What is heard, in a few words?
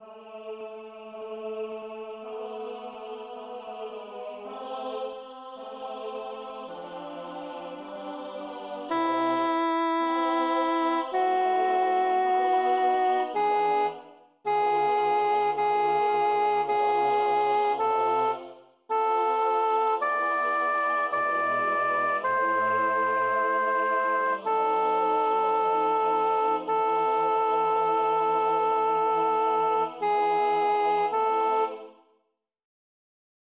Kyrie, Rossini, midi